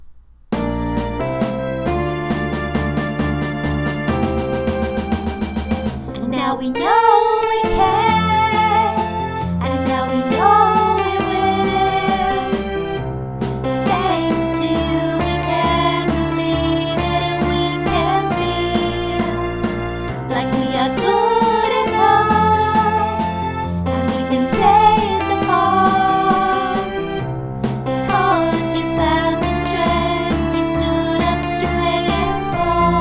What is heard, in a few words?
no you're not too small vocal, music